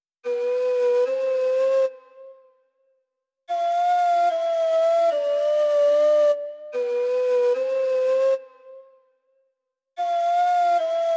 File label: 148 BPM A Min Fujara; Cymatics - 2022 Melody USB Expansion; Blue Pt 1